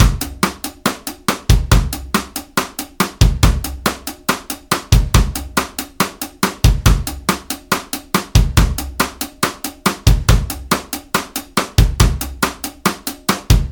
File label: drum loop country 140; guitar looper